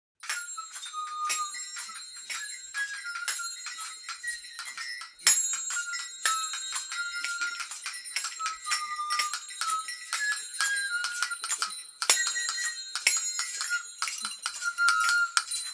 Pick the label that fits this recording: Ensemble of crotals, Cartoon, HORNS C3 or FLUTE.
Ensemble of crotals